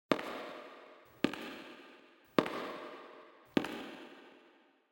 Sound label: church footstep